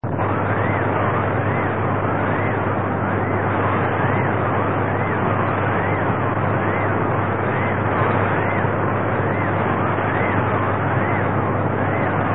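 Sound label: continuous wave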